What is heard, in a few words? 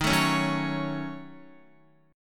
D sharp Minor 7th Flat 5th D sharp down Guitar; strummed